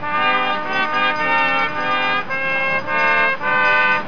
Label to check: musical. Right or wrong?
Right